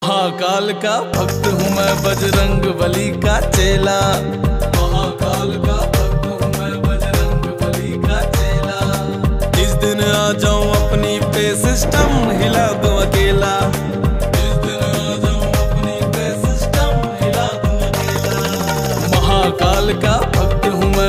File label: Ringtone